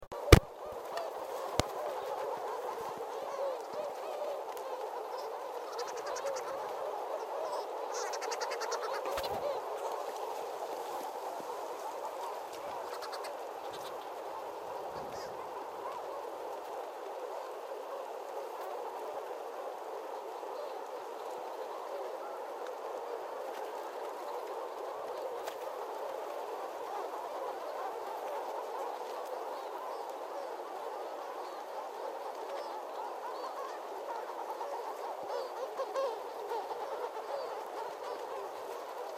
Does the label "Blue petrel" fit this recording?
Yes